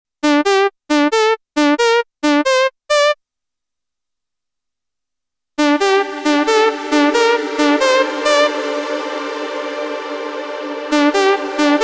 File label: KORG Hollow Mellow Lead